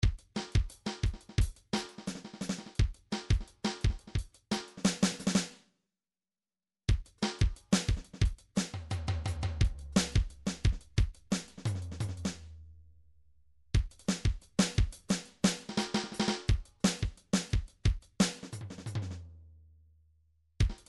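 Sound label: drums pattern, midi; Paul Kodish Dangerous Drums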